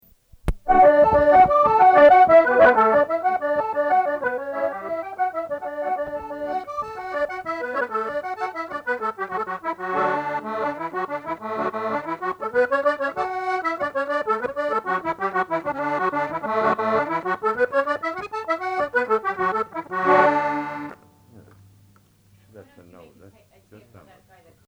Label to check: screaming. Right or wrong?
Wrong